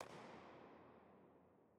Grain Silo; Abernyte

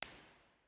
Church; St Patricks; Patrington

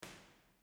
Church; St Patricks; Patrington

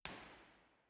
Church; St Patricks; Patrington

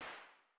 Smoking Room; Elveden Hall

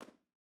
Brickworks Kiln; Errol

